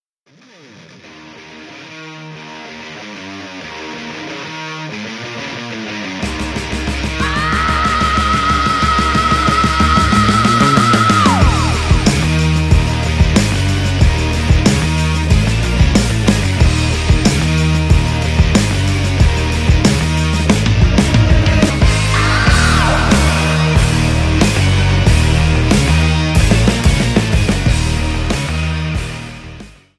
blow instrumental ringtone

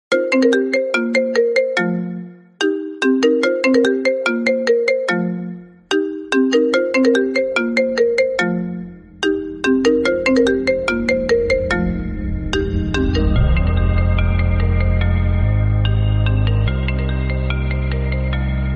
iphone trap remix ringtone

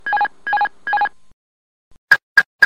airbus autopilot off ringtone

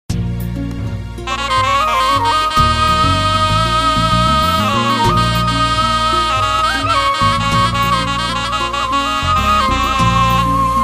instrumental ringtone

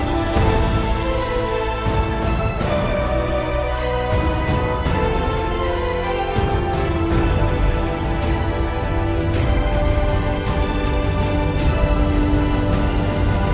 ringtone; animales fantasticos

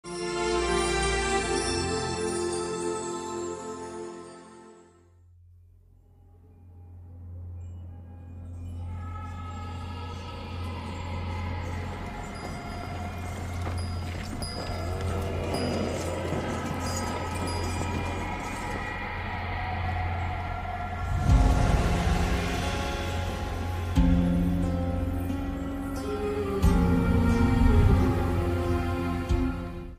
background score ringtone; padmavati background padmaavat; sanjay bhansali; deepika padukone

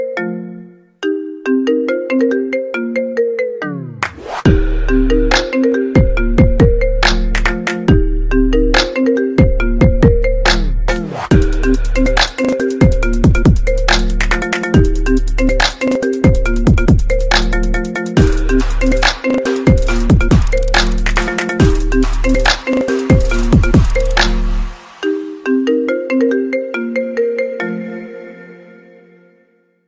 cool marimba remix ringtone

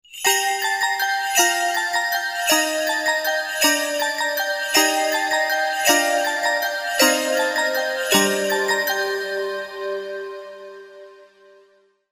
christmas ringtone

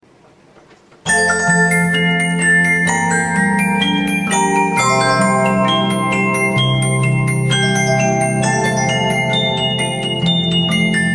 ave maria box ringtone

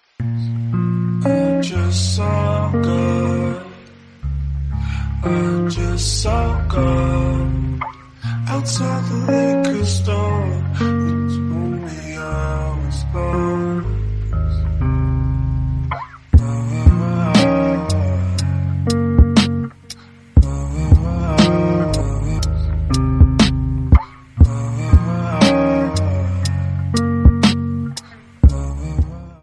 sad ringtone

